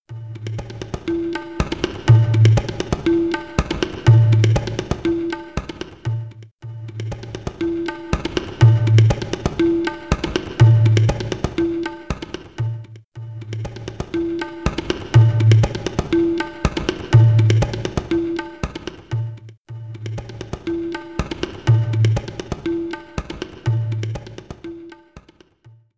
Drumming

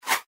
SciFi Bullet Flyby

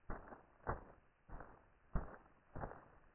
deep footsteps; Five Nights at Freddy's; FNAF 4

